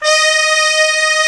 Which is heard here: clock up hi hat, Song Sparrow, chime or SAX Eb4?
SAX Eb4